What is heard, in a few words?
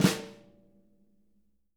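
jazz snares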